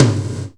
Gated Kit, Percussion; Best Service ProSamples vol.37; Dance Synths

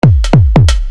Beat, Hip-Hop Street Beats

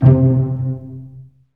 STR SEC, STRINGS; Roland - LA Composer vol. 1

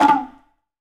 KOTSUZU, tuzumi; AKAI S-Series CD-ROM Sound Library VOL-5

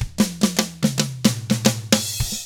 SMBA, SAMBA; ILIO; Double Platinum Drums 1

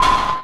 Snare, Kit; Sonic Foundry (Sony Creative Software); Esoterik Beatz; Agrymet

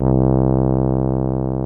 TUBA C2, MONO BRASS; Greytsounds VOL-1

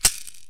AFRICAN INST; OMI - Universe of Sounds

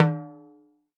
timbal lo; E-MU Producer Series Vol. 7 – Old World Instruments

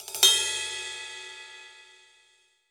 Pop Ride Phrase, Cymbals; Roland BK-5

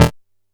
E2, Bass; E-MU Producer Series Vol. 6 – Analogia Project; Boom da Bass

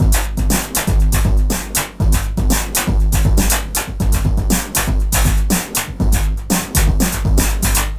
scary electro 120bpm, Downbeat Dance Grooves

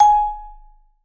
G#4, Vibes; Sampleheads; Dave Samuels; Marimba & Vibes

